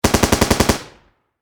Warfare Machine Gun M240B Long Burst Close Perspective; M60 Long Burst Close Perspective; Machine Guns